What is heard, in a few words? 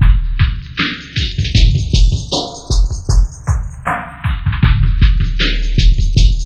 FILTERS, Dark Side Of TripHop; USB Soundscan vol.35; AKAI